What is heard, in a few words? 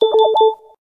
Low Battery